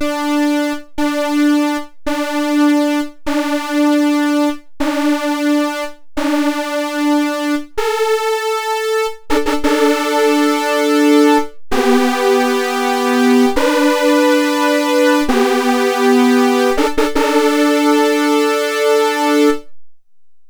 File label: Sol Supersaw; Solaris Supersaw #2